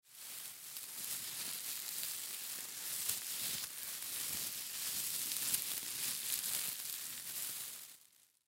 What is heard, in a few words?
Christmas Garland Handle Long; Holidays; Blastwave; Foley